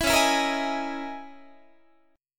C sharp Minor 6th Add 9th C sharp, Guitar; C#m6add9; strummed; JGuitar

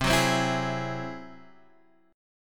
G sharp Augmented 7th C

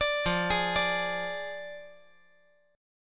F Minor 6th F down

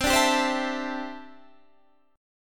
D 9th Suspended 4th C

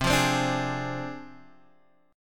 D Minor 7th Flat 5th C, guitar chord

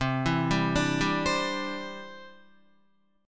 C Major Add 9th, Guitar, Cadd9 chord; arpeggiated; JGuitar

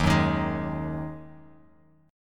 D sharp Minor Double Flat 5th, Guitar, Open F; strummed; chord; 3,1,3,3,x,x

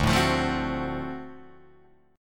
D 7th Sharp 9th D down Guitar Drop D

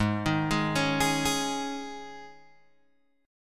G sharp Suspended 4th G sharp, Guitar Open G; 6,8,6,6,9,6

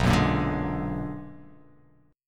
B Minor Major 13th, chord; strummed; JGuitar

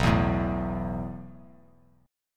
B 7th Suspended 4th B down; B7sus4; strummed; JGuitar

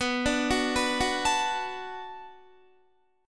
B Minor 7th B Guitar, chord; Bm7 arpeggiated

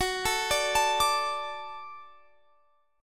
Gb Minor Sharp 5th; Gbm#5; arpeggiated; x,21,19,19,22,22

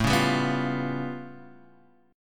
A Augmented 9th A

